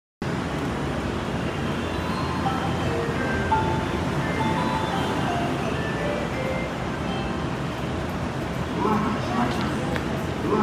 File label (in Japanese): 森ノ宮発車, train sounds